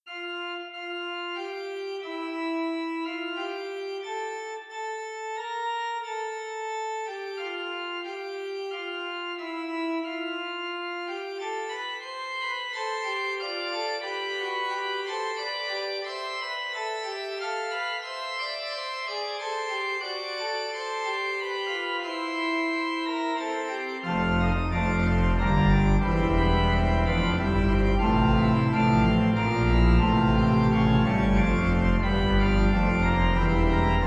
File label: Organ, Wet; America; God Save The Queen